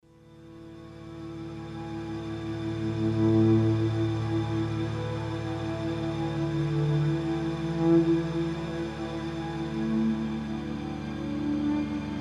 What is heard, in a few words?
pad, ambient